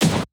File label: hawaiian kick